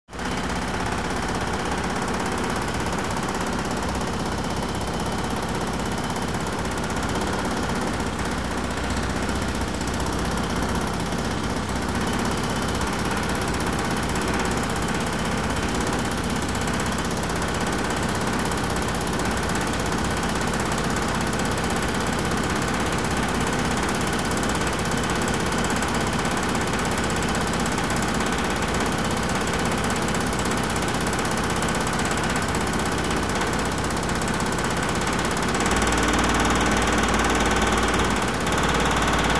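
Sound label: road works sound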